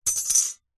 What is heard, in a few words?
office ruler metal set down concrete; zapsplat